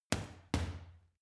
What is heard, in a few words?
boxing punching bag uppercut double medium